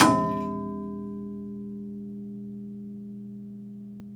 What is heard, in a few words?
gong